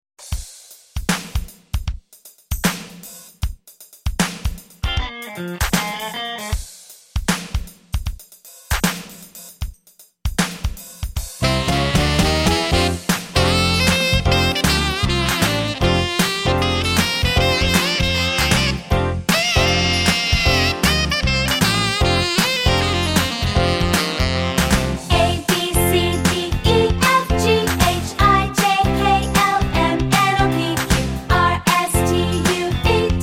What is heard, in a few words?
Alphabet Rock